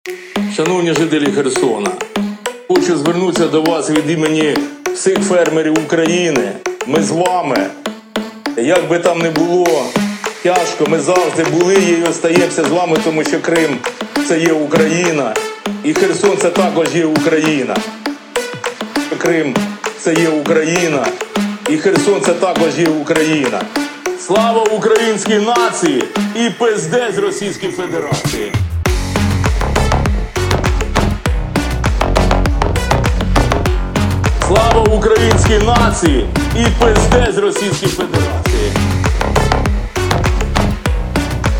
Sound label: Remix